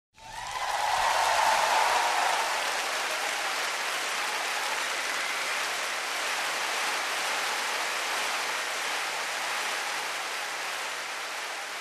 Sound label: square cheer